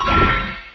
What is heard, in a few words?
menu select; stormux